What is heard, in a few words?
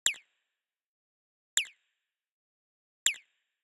UI Sound Pack Select; Kpow Sounds